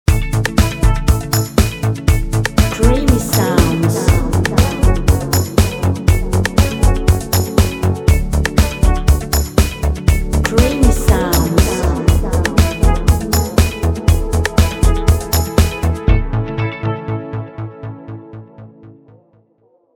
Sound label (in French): creation musicale pop urbain